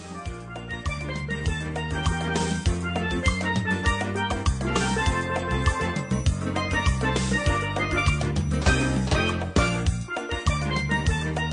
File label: Highlife